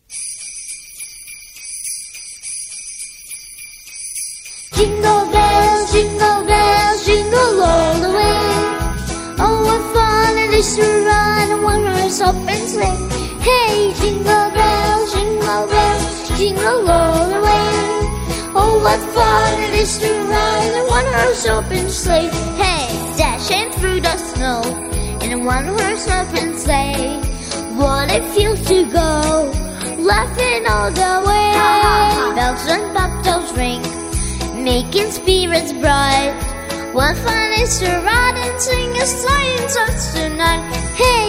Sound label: Jingle